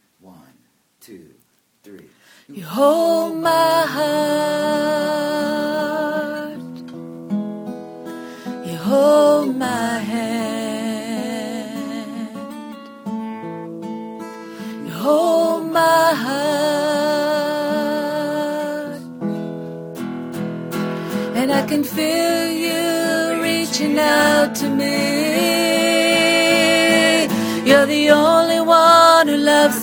Alto

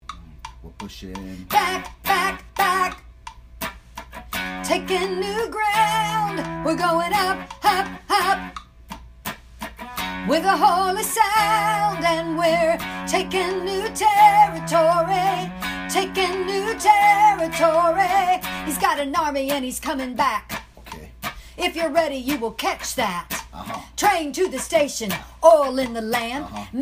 fired up rap

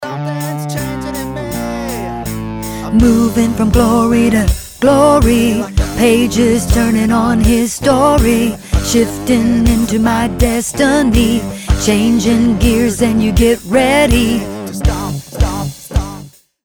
its a god thing bridge 3rd above

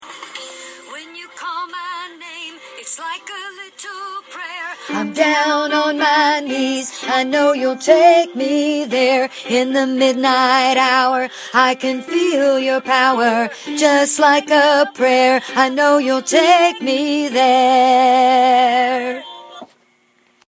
Chorus 3rd below

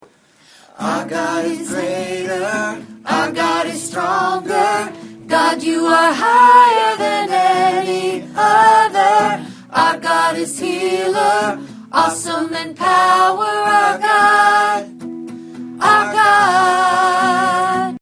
Soprano

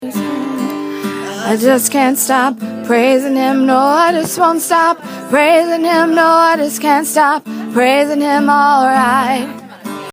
alto

